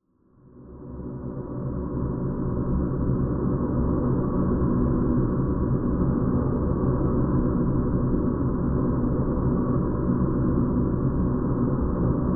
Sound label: Horror Drone Haunting Vox Low; Scary Tones; Sound Effects:Horror